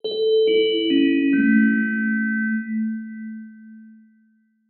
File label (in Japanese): お知らせ 終わり リバーブ有り; 戏剧音效